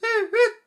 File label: bird; Syrinscape unplugged; Jungleplanet dinolaser battle